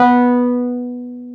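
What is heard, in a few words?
piano Wurly; Keys of the 60s and 70s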